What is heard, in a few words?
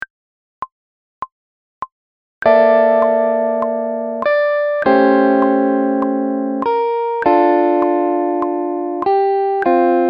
blue chords